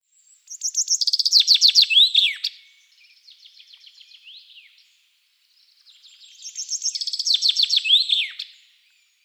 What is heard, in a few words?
Fringilla coelebs